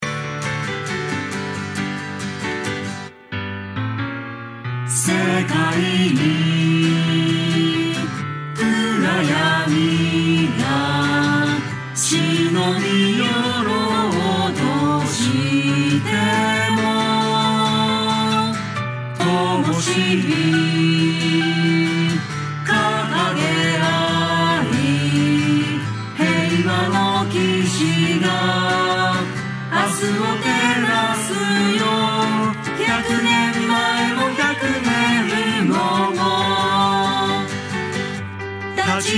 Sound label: piano and guitar short singing